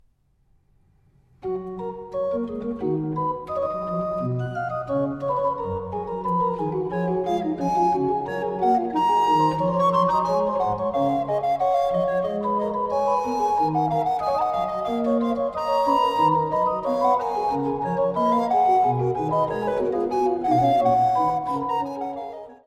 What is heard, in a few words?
Organ sonata Allegro moderato; BWV 525